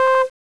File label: beep; tfc; toast